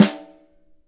long room reverb steel snare drum sound g key

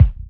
00s phat kick single hit d sharp; kick drum; D sharp key

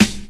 puffy steel snare drum g sharp key

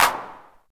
clap a sharp key, Loudest frequency: 2763Hz; percussion; single hits one shots

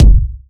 subby bass drum one shot f sharp key; kick drum; kick drum samples

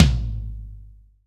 ambient tom a sharp key; tom drum

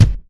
good kick drum one shot g sharp key

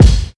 rich mid range kick drum one shot g sharp key

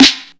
perfect snare one shot C sharp key; Duration 0:01; snare drum samples

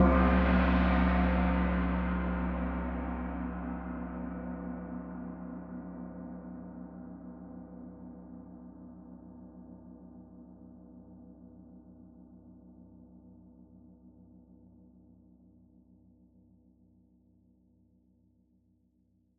gong hit low medium, Cm; cymbal drum

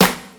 sharp steel snare drum g key

